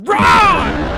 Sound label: wrong